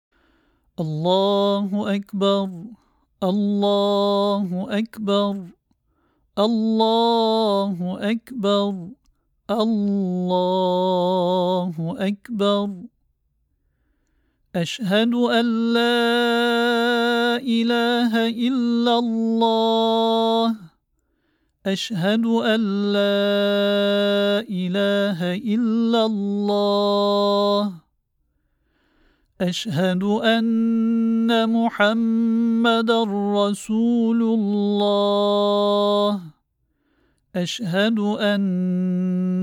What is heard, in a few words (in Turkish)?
Muezzinlik